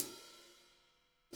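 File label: hats open, Drum Kits; Sonic Reality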